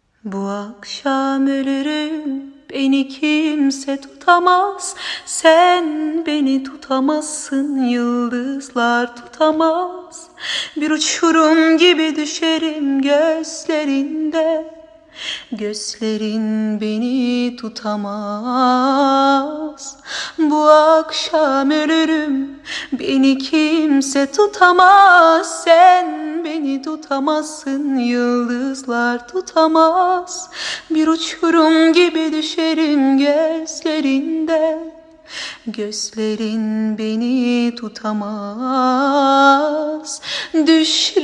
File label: acapella cover